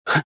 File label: jump; rebel